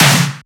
SnareClap; FLPRO

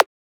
Transient; FLPRO

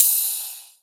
Ride; FLPRO

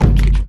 Kick

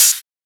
Open Hat; FLPRO

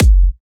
Kick, Kicks; FLPRO

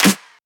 Shifted Clap; FLPRO

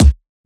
Perc, Percussion; FLPRO

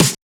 Snare; FLPRO

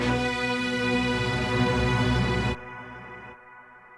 Synth Sweep

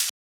Shaker; FLPRO

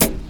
Hit, Percussionz

